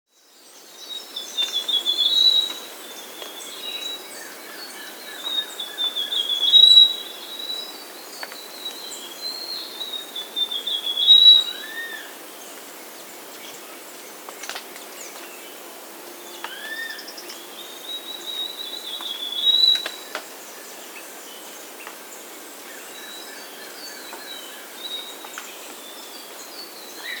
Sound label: Russet-Crowned Warbler (Basileuterus Coronatus), Andean Birds; S. America